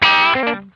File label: LINK, Funk Guitar; Zero G - Funk Guitar